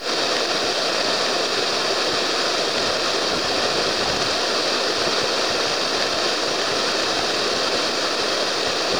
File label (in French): son moyenne cascade